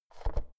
oven knob press out hard

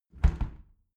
window close tilt regular sequence

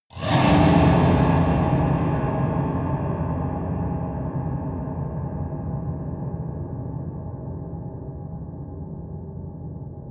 piano twang multiple fast long

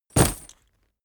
leather metal bodydrop

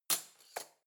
cutlery spoon drawer place

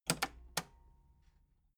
metal button cassette rewind slow

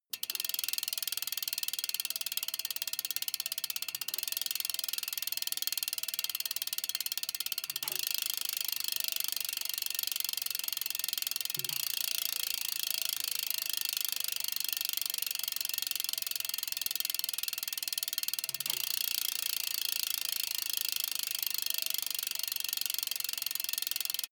bmx bike freewheel push and slow down

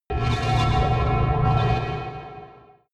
space alien machinery working

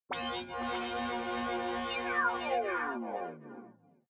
sci-fi rising small planet

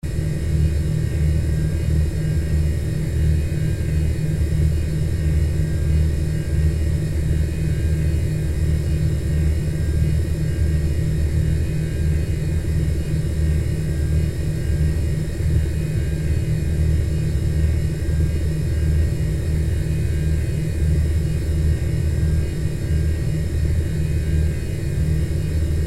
converter ac

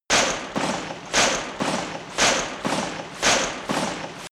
industry machine mechanic dense

